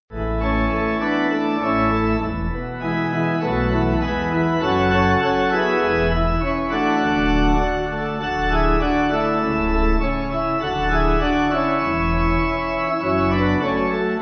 Pipe

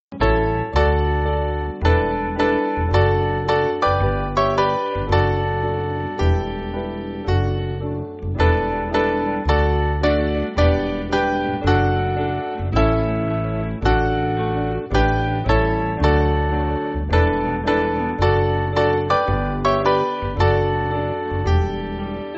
Piano

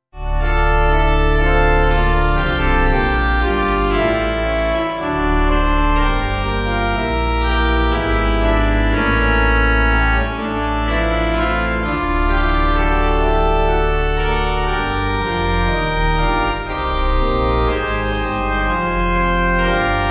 What King Would Wade Pipe

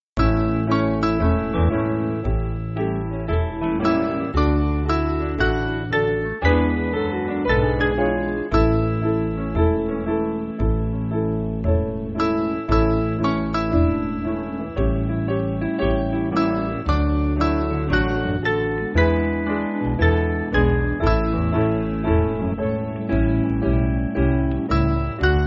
Piano

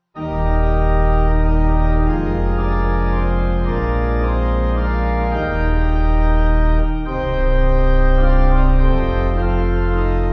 Why Did They Nail Pipe

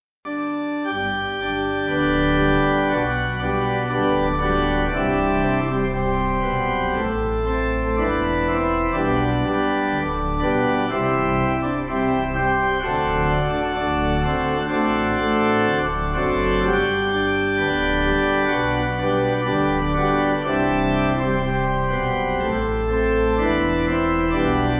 Pipe

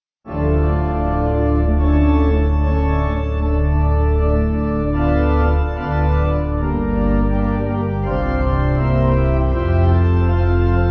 Pipe

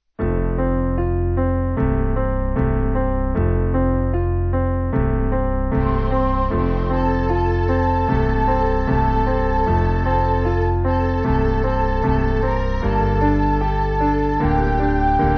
Piano

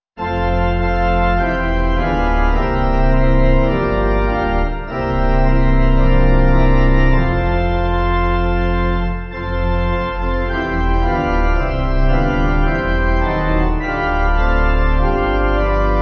Pipe

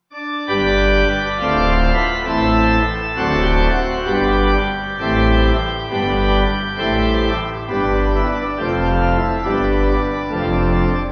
Pipe

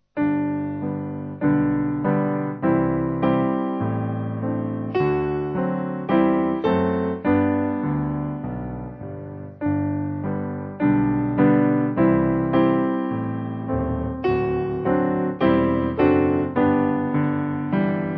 Piano

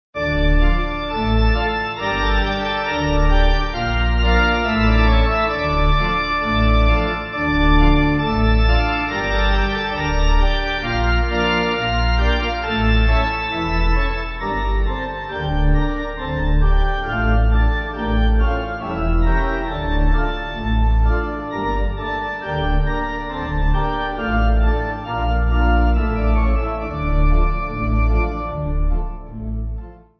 Pipe

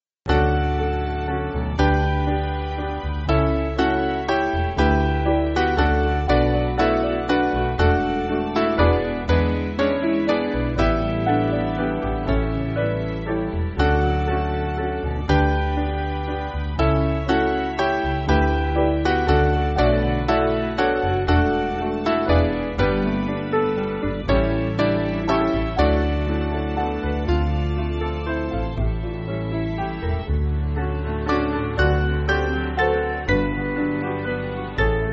Piano

